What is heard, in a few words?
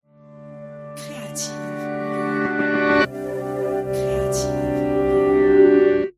piano